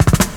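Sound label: snare roll, Ragga & Dub Reggae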